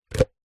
sport cone plastic stack others

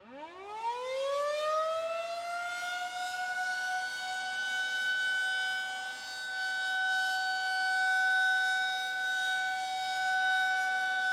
Air Raid Siren; Blastwave FX

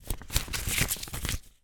foley money notes x3 count into hand

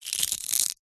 egg shell already broken crush crack in hand; zapsplat

